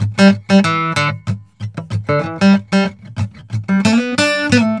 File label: GUITAR SAMBA